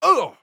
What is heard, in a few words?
death; Astro; Paladins